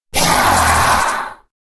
death

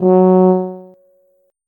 tuba note

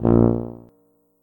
tuba note

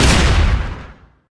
hagexp, weapons

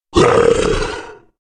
death

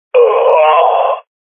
death

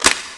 item pickup

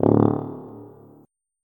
tuba note

